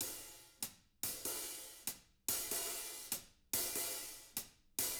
HIHAT LOOPS, Living Drums

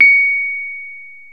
PNO, Rhodes Mk.V; Keyboards of The 60's and 70's

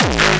hard bass drumz

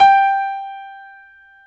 PNO Grand Pno; Club-50 - Foundations Roland

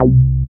SYN TB303, Roland

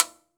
L TIMBA, TIMBALES; AKAI S6000 CD-ROM - Volume 5

